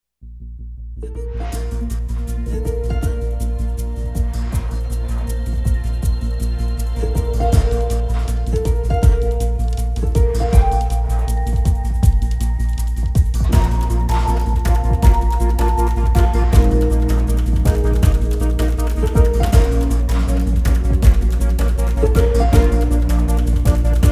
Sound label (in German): Ringtone Bewegend; OEBB Ringtone 1